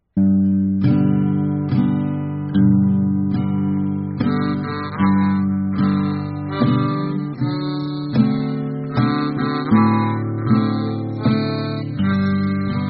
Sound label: low guitar Pulmonica